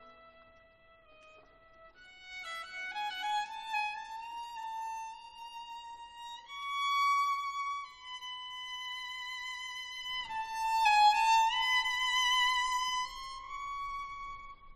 Adagio E-dur na skrzypce i orkiestre; Wolfgang Amadeus Mozart

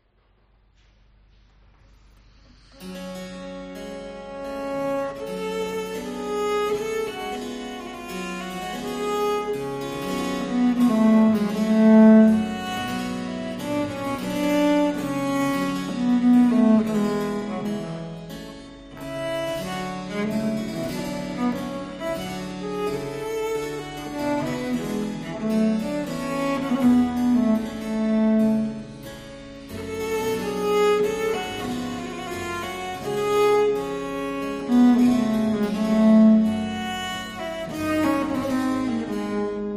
Sonata na viole da gamba g-moll, Largo; Georg Friedrich Handel

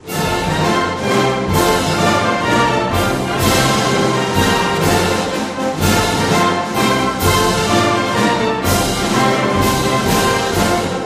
Hymn Polski

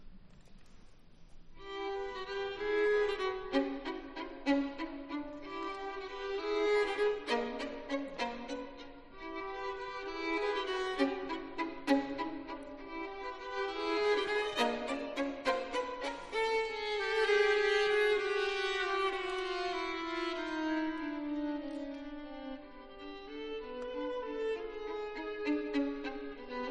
Koncert skrzypcowy, Allegro

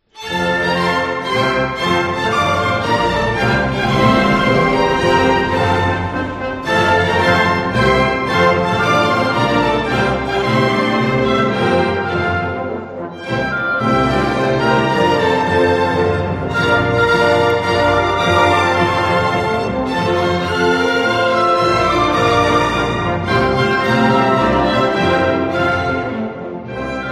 Hymn Polski

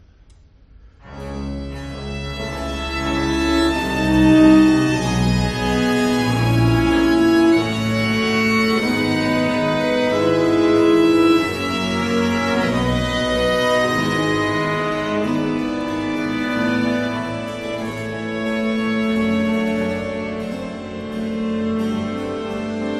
Koncert na czworo skrzypiec F-dur Adagio; Antonio Vivaldi

